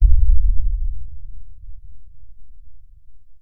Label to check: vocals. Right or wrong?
Wrong